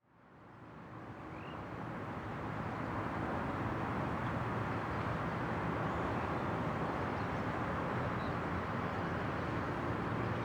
Rumble cidade fim de tarde com transito pesado desde terraco de predio

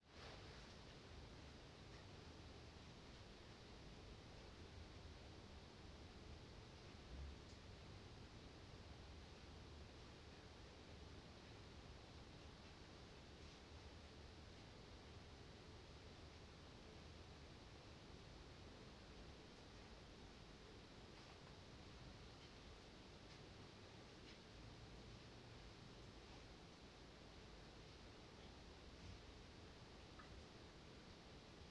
Ambiente quarto fazenda dia com alguns barulhos; ambiencia domestica

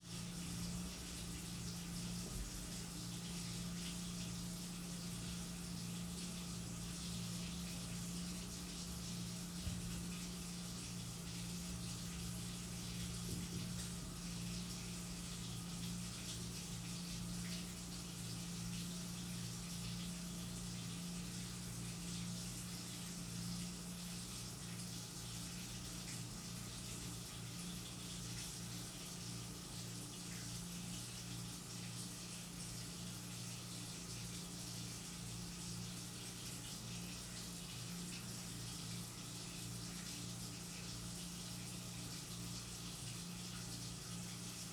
Agua escorrendo por torneira motor de agua ligado noite

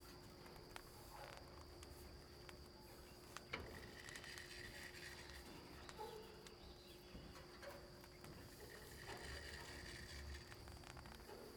Balsa em movimento com estalos e grilos; maquinas; canais-stereo; colecao sonora do cerrado

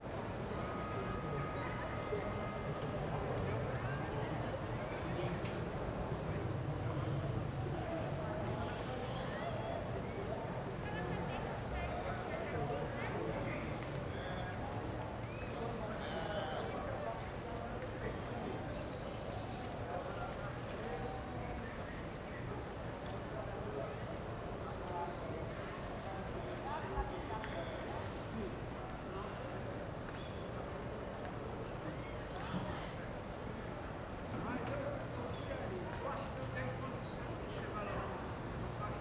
Ambiencia Setor Comercial Sul de Brasilia area de predios comerciais

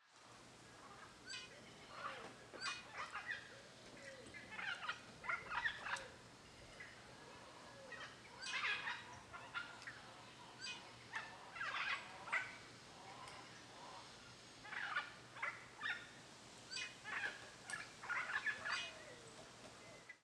Araras Cantando ao Longe em Quintal de Alto Paraiso; aves

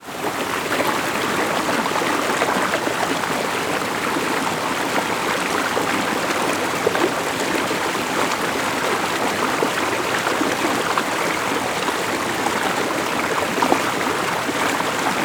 Pequena corredeira com microfone bem proximo; agua

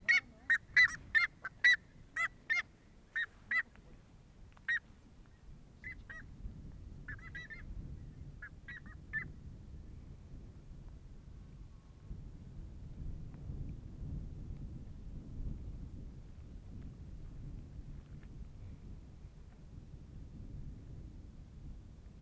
Curicaca em ambiente rural com vento suave; aves

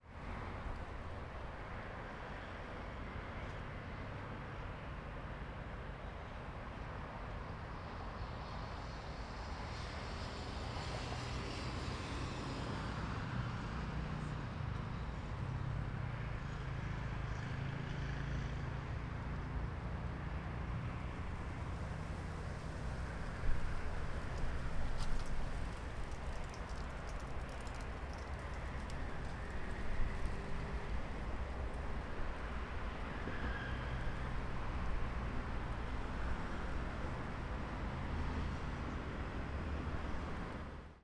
Eixo Rodoviario Motos Passando; transito

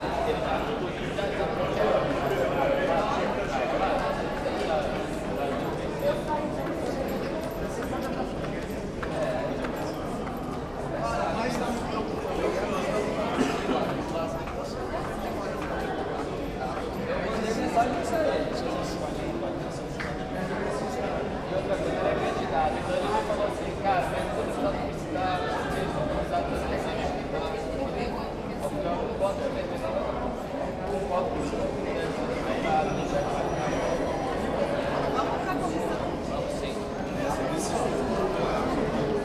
Vozerio com passos e tilintar no corredor; multidao

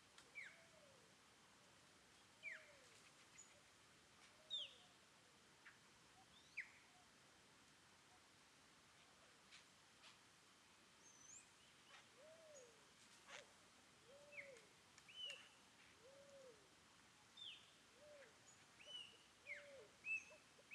Varios Passaros Diferentes em Estrada de Terra Longe da Cidade; aves; Incluindo Fogo Apagou; Coleção Sonora do Cerrado

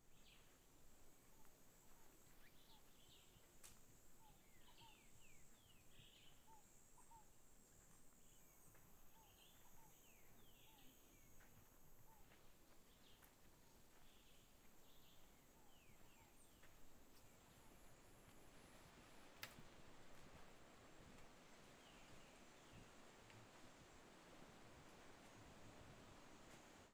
Cigarras passaros mata fechada; insetos

